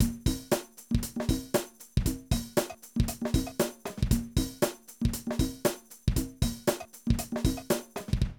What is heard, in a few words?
Drum loops, 70s funk